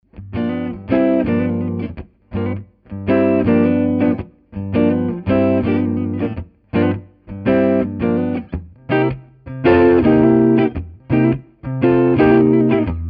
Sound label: clean neck; schwarzbach; HB59-CreamGen